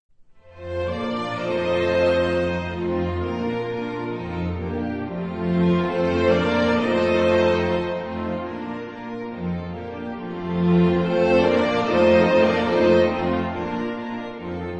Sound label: Accordian